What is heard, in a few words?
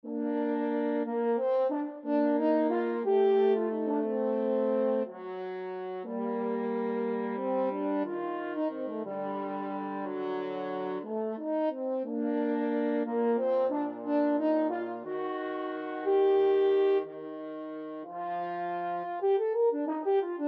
duos adagio, french horn duet